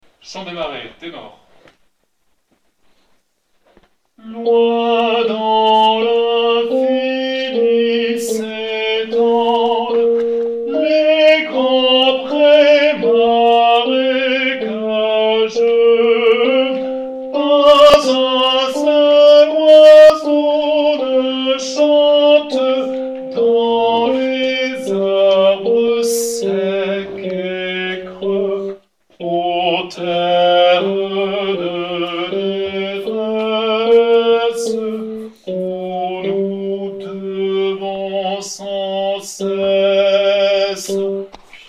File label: Tenors; Chant des Marais